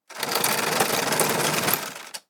Hamper drag slide on ground; basket

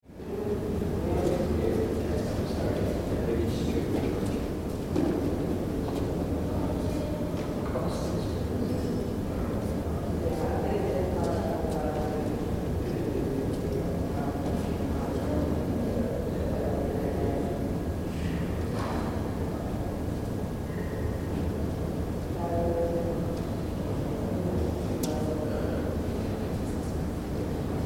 Museum room tone large room interior people

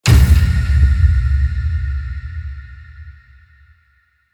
Dramatic scare impact suspense hit

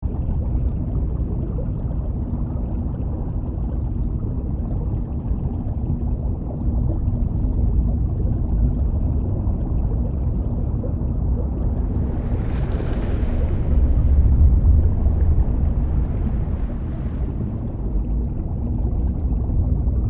Submarine traveling underwater perspective; vehicle

